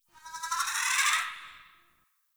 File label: Tree Critters Angry; Ambience; Props; Warframe; Duviri